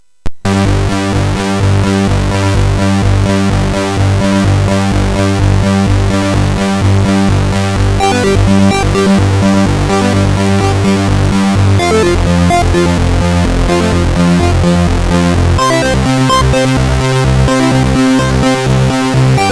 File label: Chords, MOS6581R3